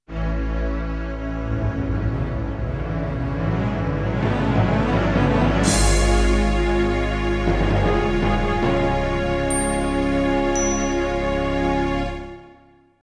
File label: fanfare; SQ5; Sierra Fanfare